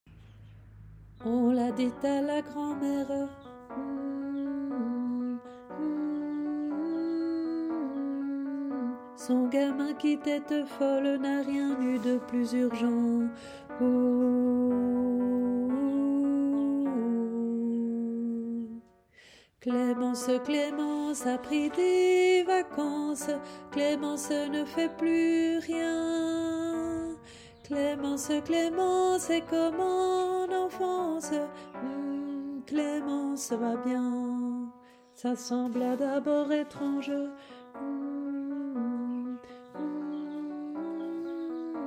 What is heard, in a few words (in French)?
VOIX Mezzo; LA CHORALE ANARCHISTE